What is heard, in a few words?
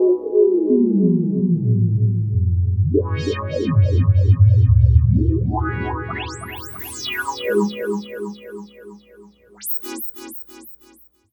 Whale Song, Synths; Sonic Foundry (Sony Creative Software); Modular Electronica